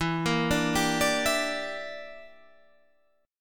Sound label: E Minor 7th Flat 5th, Guitar, Half Step Down